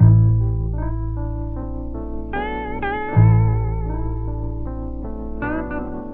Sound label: bass chains; Loops; Phonky